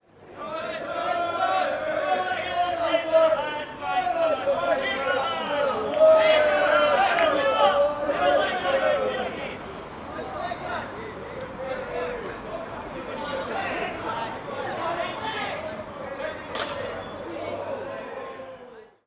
Drunks Fighting

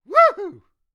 Male voice woohoo

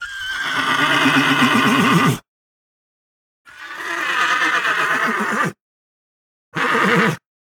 Neighing Horse

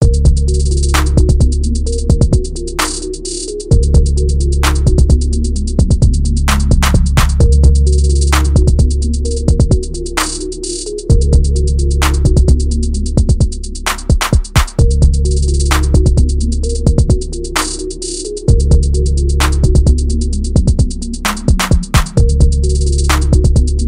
Trap Beat

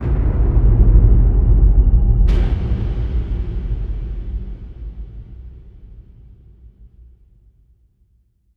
Explosion

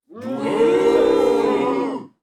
Voice Crowd Small Expression Boo Stereo

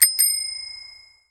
Common Bicycle Bell

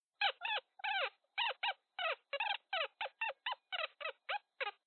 Rubbing Squeak

